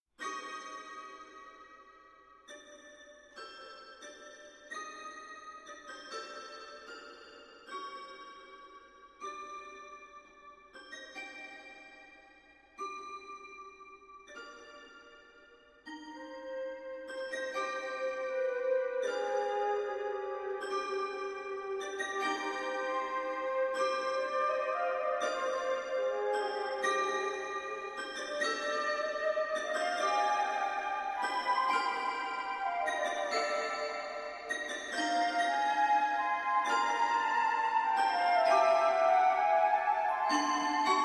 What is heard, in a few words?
waltz